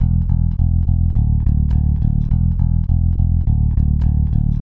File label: Bass Guitar Loop 104 BPM